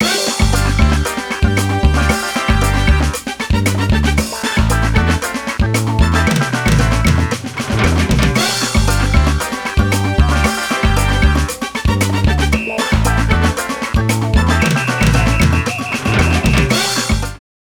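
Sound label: Golf Menu; Monkey Gold Menu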